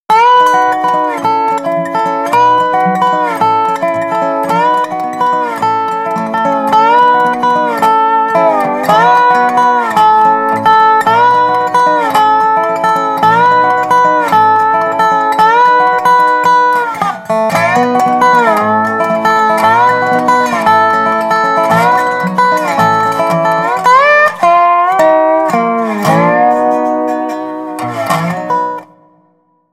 Dobro FX

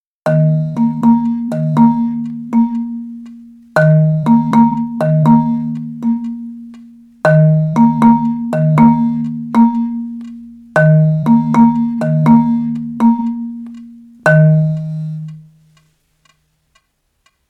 Marimba FX

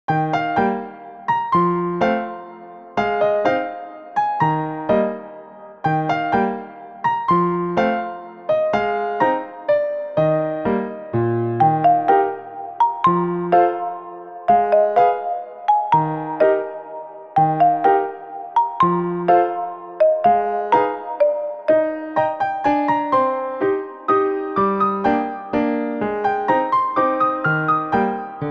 Newage; 밝고 맑은

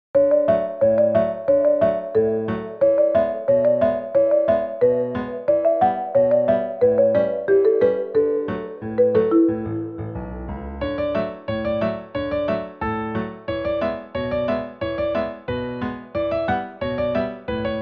Newage; KIDS 귀여운

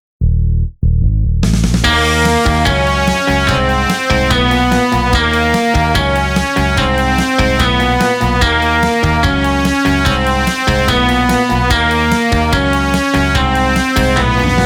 Thrill Funky; 리듬BG

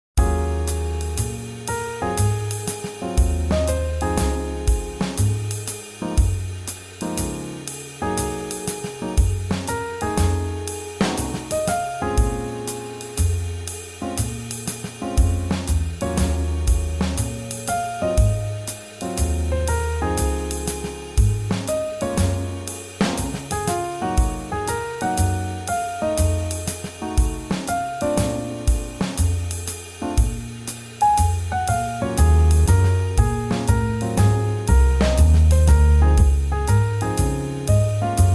Swing Jazz

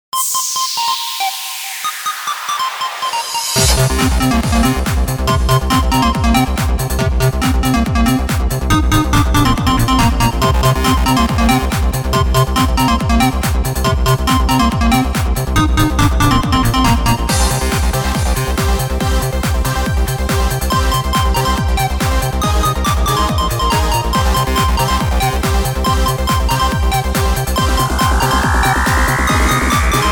Electronic, EDM

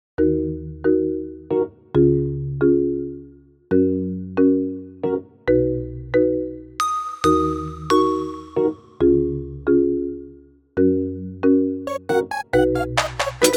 City Pluck, Normal POP

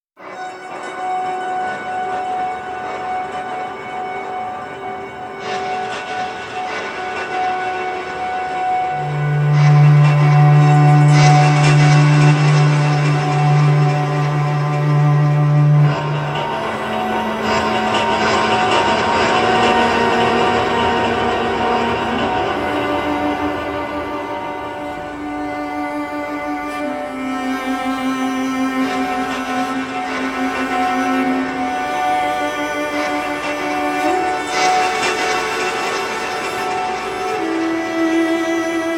Suspense, Tension Slow

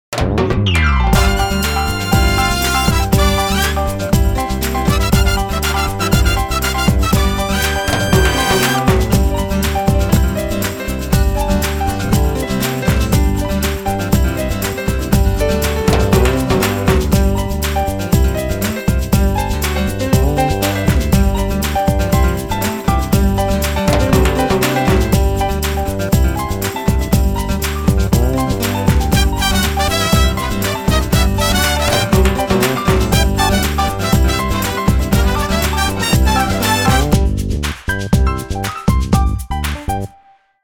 Big Band; 경쾌

